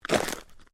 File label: FOOTSTEPS SNEAKERS ICY SNOW STEP STEREO, Walking in sneakers or runners in light snow